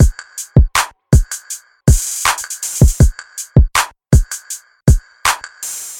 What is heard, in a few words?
80 bpm trap drum loop C, Hard trap drum loop with bass drum tuned in C; drum loops